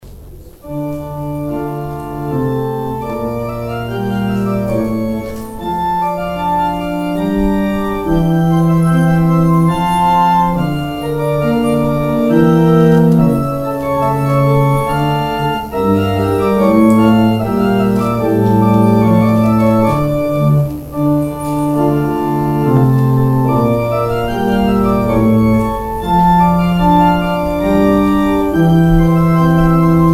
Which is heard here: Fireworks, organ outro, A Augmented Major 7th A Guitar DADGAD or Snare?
organ outro